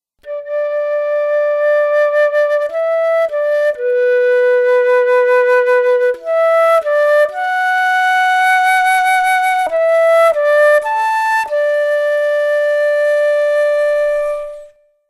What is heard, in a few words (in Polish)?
flet poprzeczny; Nagrania Lokomotywy; edukacja wczesnoszkolna